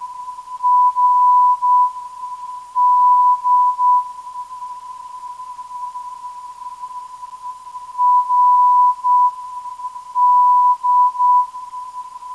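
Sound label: 391.29kHz, non-directional beacon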